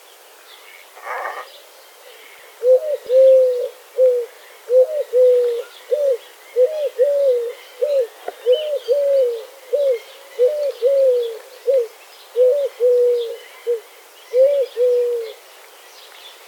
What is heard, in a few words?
glossy ibis; اکراس سیاه